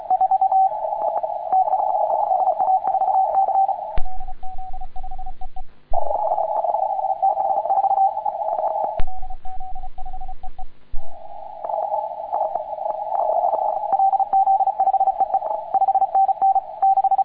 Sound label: CW 3,5MHz; SACCW